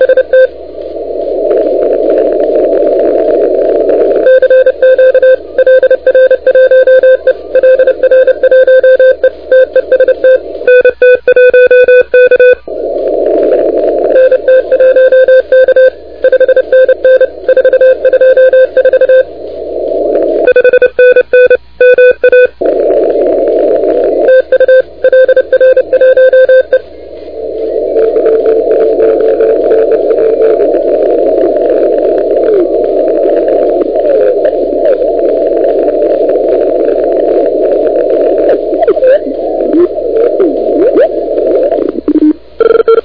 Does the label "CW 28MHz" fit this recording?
Yes